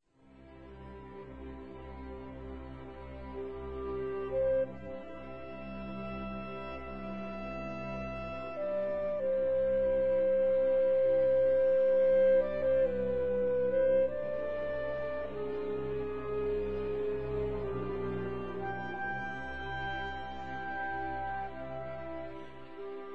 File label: clarinet; western